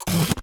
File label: Camera Single